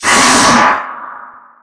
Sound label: spider ouch